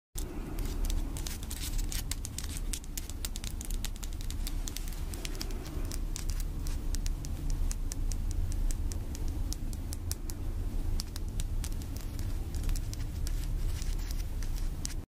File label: ASMR long nails